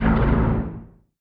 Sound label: distance laser hit; weapons; Stellaris